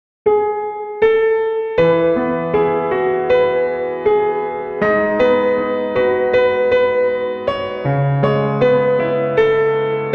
piano Ver.

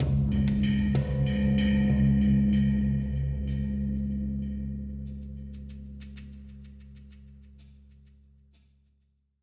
Cave PERC; Minotaur Windows gamerip 2017

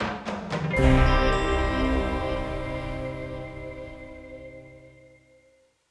stinger release; Griftlands

